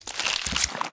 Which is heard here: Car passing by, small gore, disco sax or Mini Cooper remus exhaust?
small gore